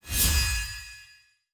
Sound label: ui arrive